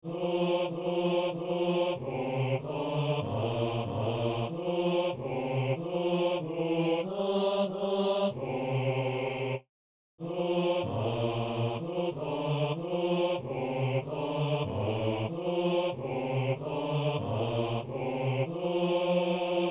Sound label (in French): basse; C'est toi, Seigneur, le pain rompu